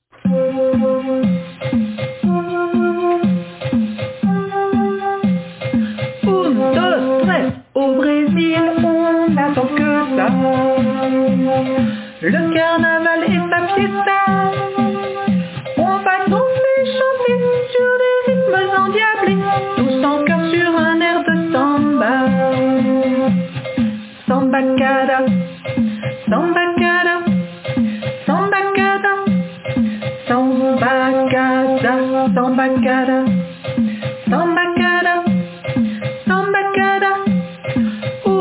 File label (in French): Samba Cada pour Chanter